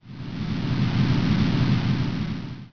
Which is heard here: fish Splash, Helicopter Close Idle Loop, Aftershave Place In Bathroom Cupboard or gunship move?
gunship move